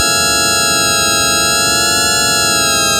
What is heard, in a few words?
spooky, synth